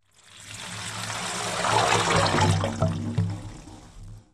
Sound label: slime